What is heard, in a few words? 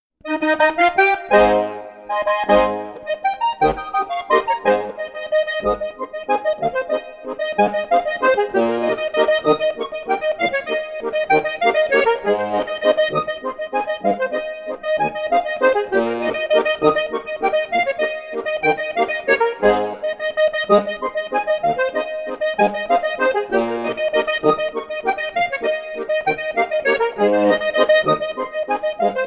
edelweiss landler